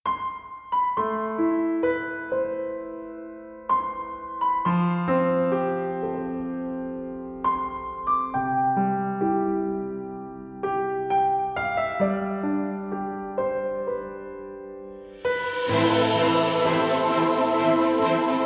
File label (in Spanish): Musica Artistic Twirl